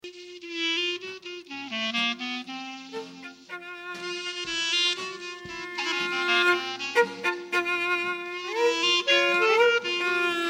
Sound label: bulgar; tabor; b-moll